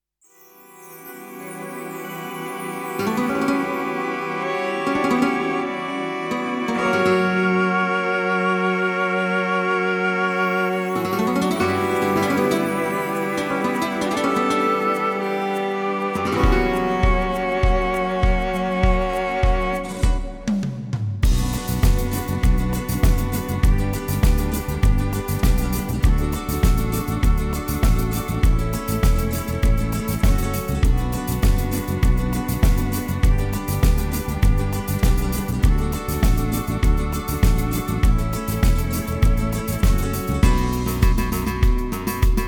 modern spanish pop rumba pop cello and flamenco guitar